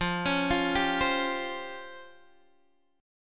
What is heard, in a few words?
Db Major 7th Flat 5th, F, guitar